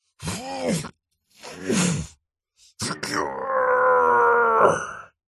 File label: human male middle aged strained vocal grunt groan; zapsplat